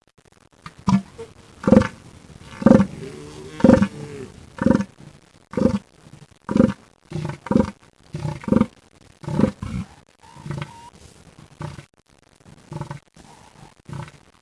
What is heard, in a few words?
two male elephant seals